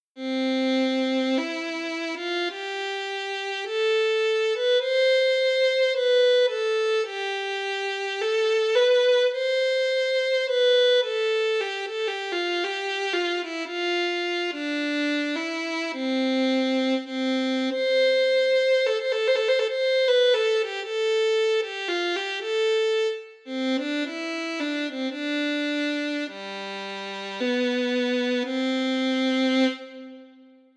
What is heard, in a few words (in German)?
Geigentest